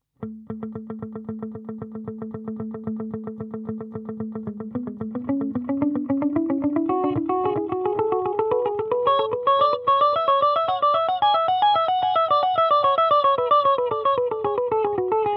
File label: Les Paul Clean Delay